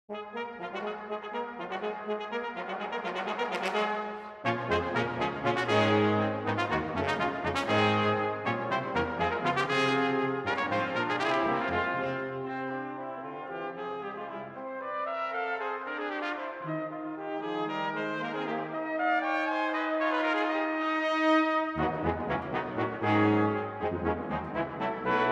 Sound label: Quintet, Vivo, Brass Quintet; Atlantic Brass Quintet; A Musical Voyage